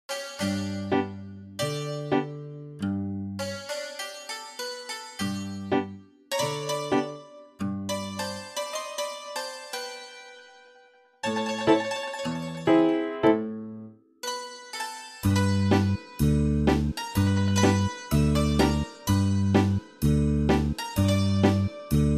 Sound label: sirtaki; Ethnic